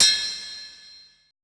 HI TUBE, PERC; BPC PERC

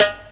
SD, dance drums hip

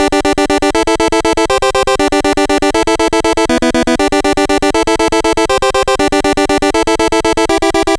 NES Synth, chiptune; Sonic Foundry; Nintendo Entertainment System

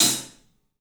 club hat; 3D Sound; 3D Drums

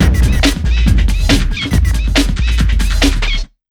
140, Big Beat Drumloops

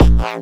Distortion Kicks, Bassdrums

